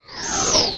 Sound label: bash